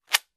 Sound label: Play Cards